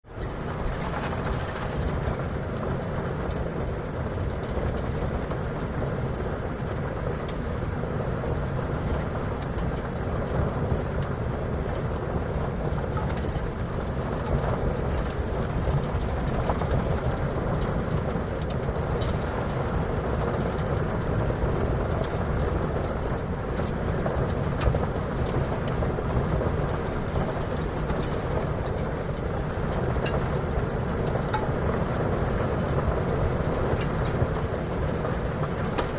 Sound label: Car Tires Dirt Mediu, Car Tires Dirt; Start, Slow Roll With